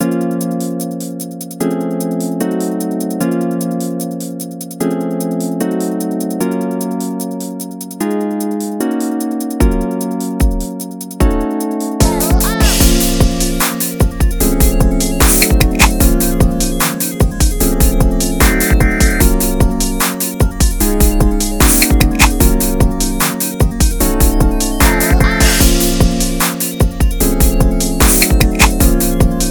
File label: Instrumental